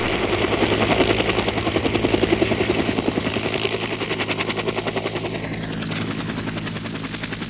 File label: Huey Flyby